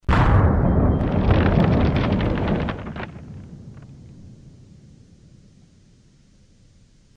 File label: Crash experiment; shrimp